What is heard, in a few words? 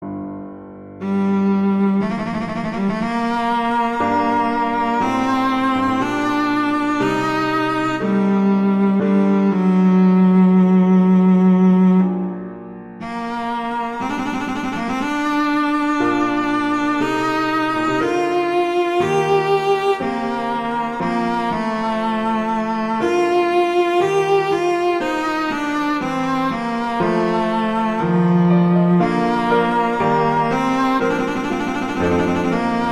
Adagio; B Marcello; Sonata